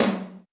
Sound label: Roland U220 Dry Set